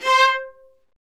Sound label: String Sections, Violas Marcato